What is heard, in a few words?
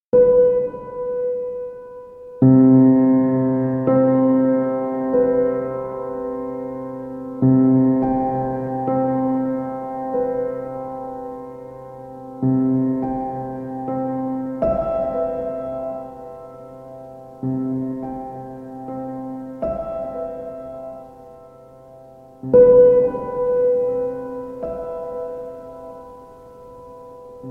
Piano Sounds